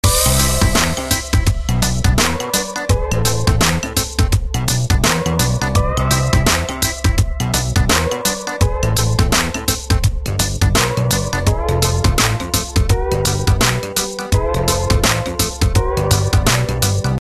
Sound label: Packaging Loop; SpongeBob SquarePants Plankton's Krusty Bottom Weekly flash gamerip